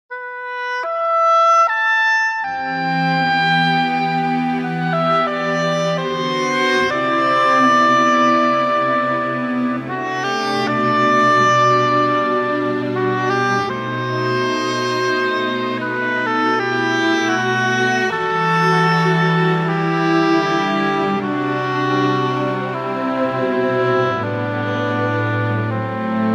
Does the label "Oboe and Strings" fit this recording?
Yes